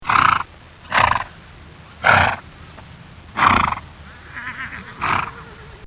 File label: Horse Snorts; Animals